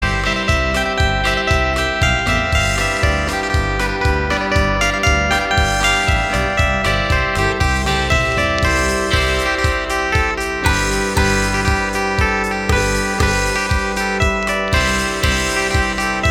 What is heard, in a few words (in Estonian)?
polka; kahe duuri